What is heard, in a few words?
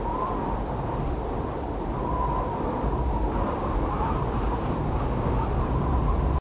cold wind, environment; t2-mapper